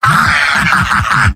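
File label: laugh short, male; demoman; tf2; garrysmod; mvm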